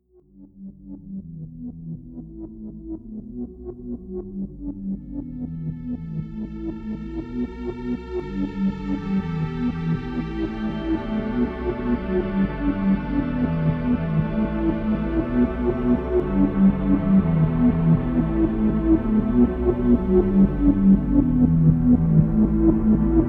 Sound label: sequence wub wub